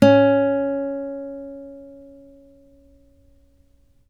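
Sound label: acoustic finger C#3, guitar; East West; Steve Stevens Guitar Samples Collection; ACOUSTIC FNG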